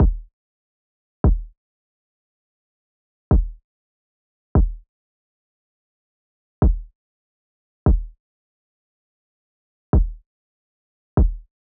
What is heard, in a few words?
145 bpm Fm Kick; HEISTY